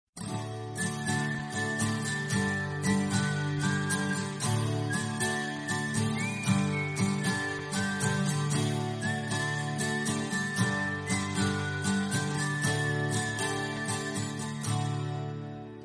guitar bgm

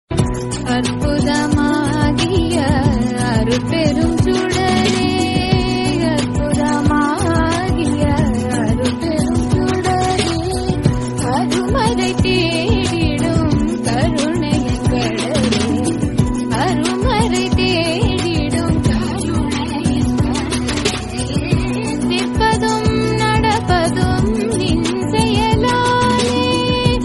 murugan suprabhatam, tamil

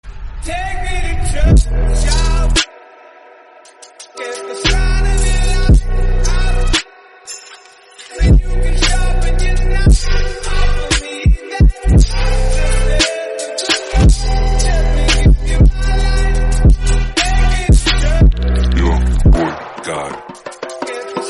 English song

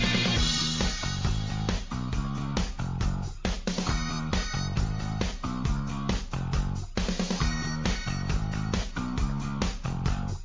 orchestral theme; ben 10 omniverse

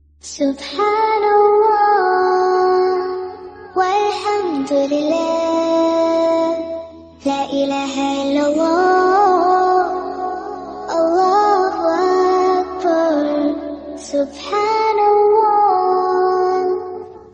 tasbih without music, islamic

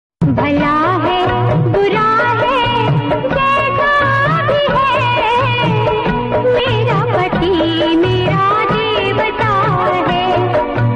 hindi old song

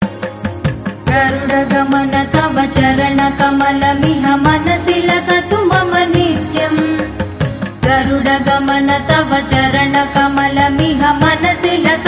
bhakti hindi; sharada peetham

